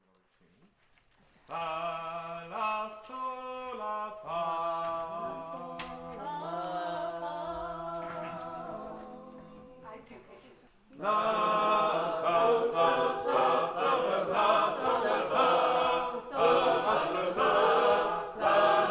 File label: Tolling Bell